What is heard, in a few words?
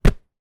sport rugby ball catch; zapsplat